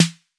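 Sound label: 808 snare drum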